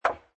Billiard Pool Snooker Ball Hits Edge Of Table No Felt; Pool ball, snooker ball, billiards ball: Ball hits edge of table, no felt